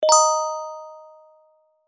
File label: bell notification